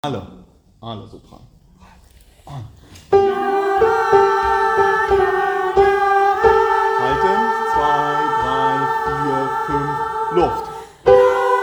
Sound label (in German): Sopran 1 und 2